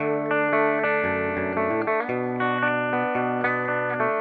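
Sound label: electric guitar, guitar; egtr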